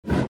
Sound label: Expand; Troll Face Quest Unlucky; Android